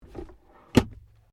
CAR SUBARU LIBERTY INTERIOR GLOVEBOX CLOSE; Family members Subaru; Vehicles; SoundEffects-Foundation1

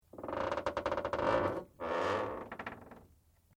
METAL CREAK LIGHT; Materials

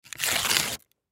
Paper Note Pad Rip Page; Office; Blastwave

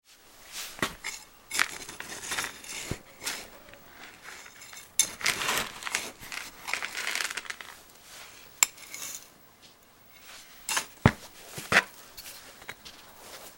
Foley Trowel Dirt Moves Grit; Archeaology; BigRoomSound

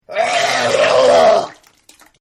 Vomit; Vomiting; Human; Partners In Rhyme

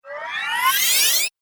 Speed Up Whoosh, whoosh sound effects; sound design

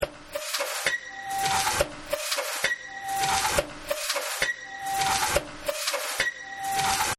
Factory; Factory 02

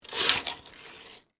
Photo Booth Curtain, Open, Foley; Slides (Sound Effects:Objects); BlastWave

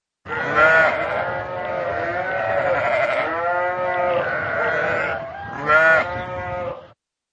Group of Sheep; Animals

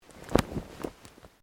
Clothing shirt cotton flap; Movemement sounds for various articles of clothing; Dressing; Human; SoundEffects Foundation2

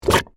Suction Mud, Texture, Suction, Mud; Water Sound Effects; Water (Sound Effects:Nature); BlastWave

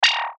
Beep Tone Signal Multimedia; Web Interactive; Beeps; Technology; Blastwave

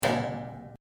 RUBBER ON METAL; Sound Effects:Materials

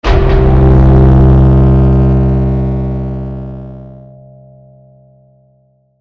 Hit Impact Synth Electrical; Blastwave

